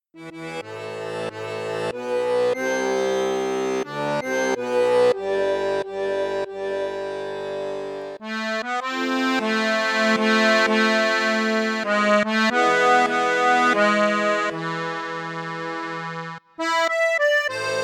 registre flute juste flute haute, accordeon; hohner morino artiste vi d

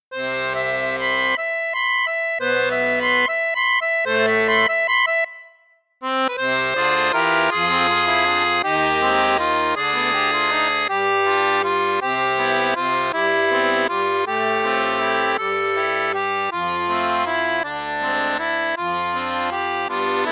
registre flute juste, piermaria accordeon; enregistrements audio réalisés par Bernard Busch; extraits sonores de démonstration pour cet accordéon

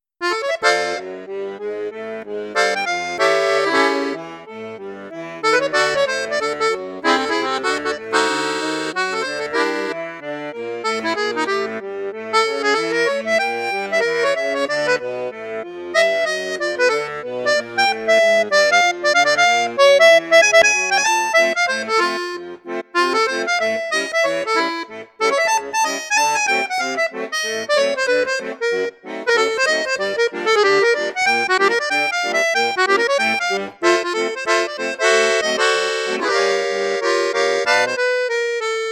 registre flute juste, accordeon; perle d or

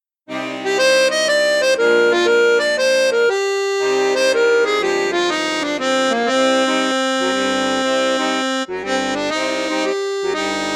registre flute juste, accordéon; cavagnolo professionnel 12

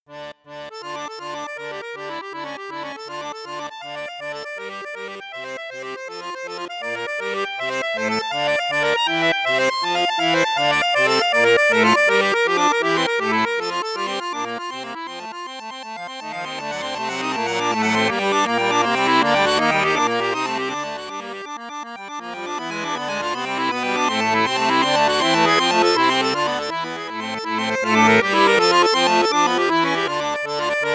registre basson piccolo, accordeon; prelude bwv851; cavagnolo professionnel 12

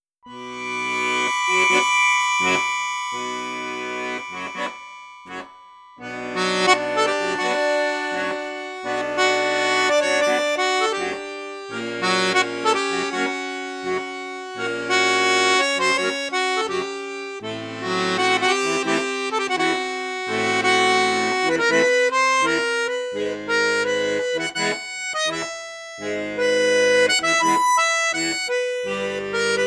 registre basson flute juste, accordeon; maugein octavia

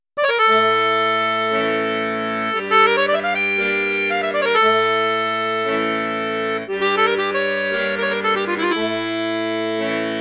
registre flute juste, accordéon; hava nagila; maugein amusette

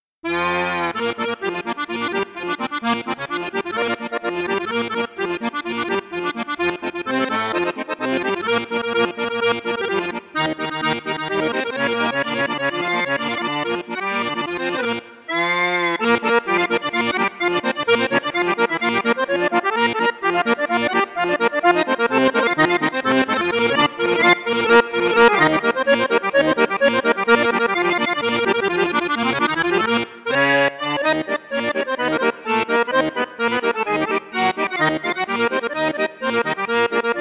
registre basson octave grave basson flute juste flute haute flute basse, accordéon; cavagnolo professionnel 10

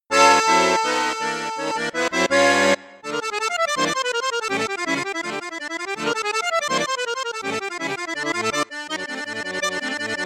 registre basson flute juste flute haute, cavagnolo orchestre accordeon

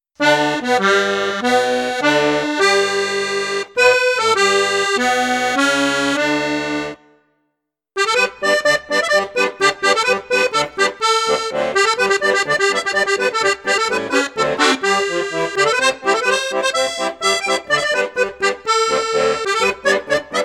registre flute juste flute haute, accordeon; oh susanna; piermaria 318 l

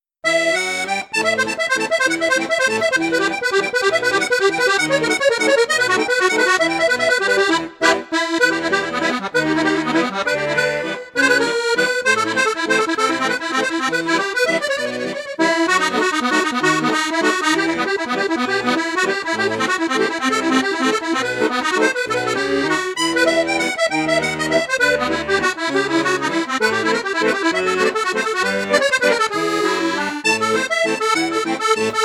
registre flute juste flute haute flute basse, accordeon; mengascini retro

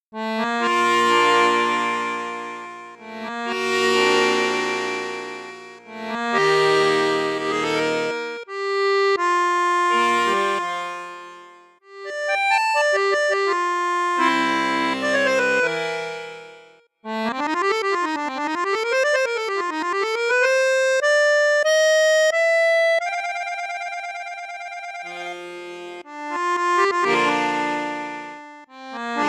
registre basson, accordeon; amazing grace; cavagnolo professionnel 12

